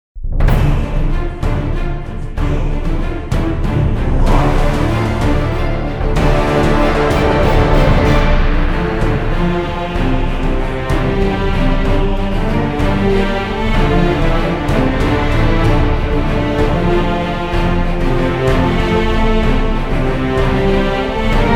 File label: Orchestral Theme